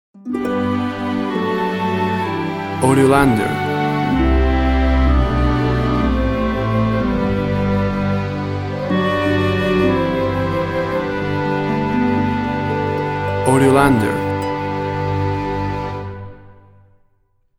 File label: happy end, Little orchestral piece that evokes a happy ending.